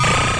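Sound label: c64 SID random, Commodore 64 8bit SID Drums; LO FI and 8 BIT KITS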